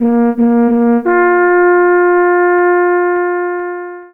horn, horn signals; wesnoth

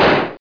gunshot; wesnoth

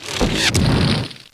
bow puny fire; wesnoth

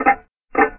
timer; wesnoth

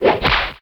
tail; wesnoth

